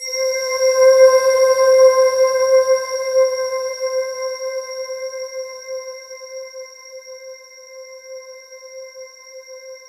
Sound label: C5, Synth Line; 90 s Sample CDs; NiceBeats; SynthLine VOL-26